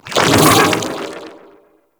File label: attack dash; Enemies; Abilities; Warframe; Amalgam Override